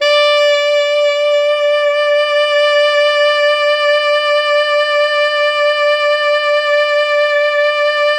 Pop Brass, D4 -L; Best Service ProSamples vol.19; AKAI

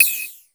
BG PERC, METALIC DRM; Crypton - DrumBase5000; METALIC DRM2

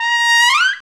Trumpets; Club-50 - Foundations Roland

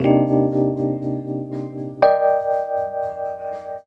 HEY, Roots Disco; USB Soundscan vol.32; AKAI

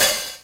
Korg N5 Rock Kit

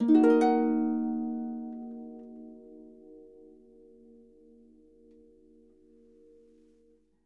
D#, Percussion & Harp; Miroslav Vitous

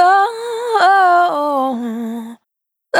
Spin Dry, Single Voice Melodic Loops

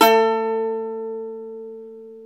SAZ, Plucks; Eth Plucks, Pluck Duo

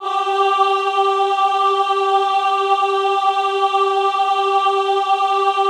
AHH G3, Extended Classical Choir; Best Service; AHH MIX

